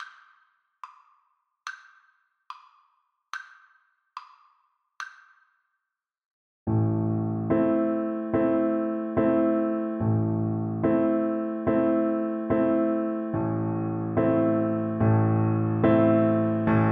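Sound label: recorder karaoke; bella ciao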